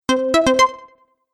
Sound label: Stage Level Complete; Video Game Sound Effects